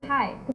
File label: beat